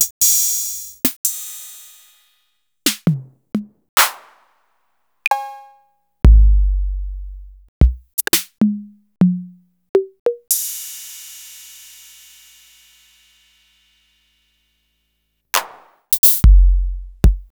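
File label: Roland MV8800 drum TR-808; TR-808MKS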